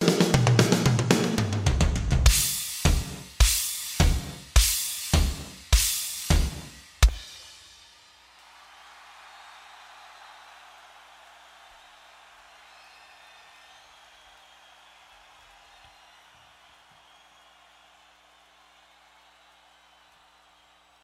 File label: Drum Doodle